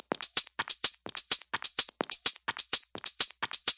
127 bpm microscopic analysis, drum loops and breaks